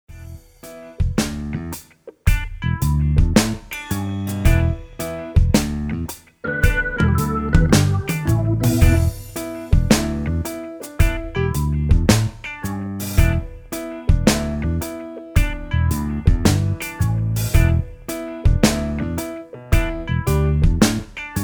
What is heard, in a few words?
Funky